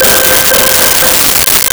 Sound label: Ice Rain C5; Nintendo; July 2020 Gigaleak